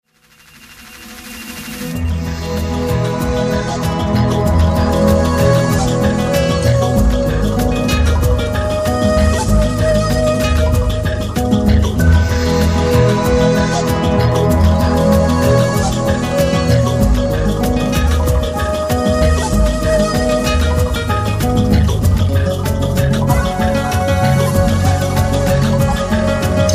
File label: Arpeggios in High Altitude